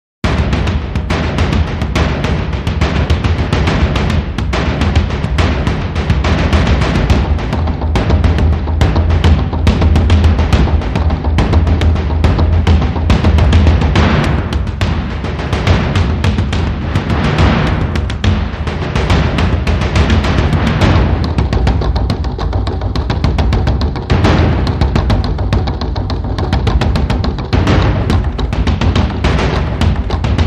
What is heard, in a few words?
Mid Drums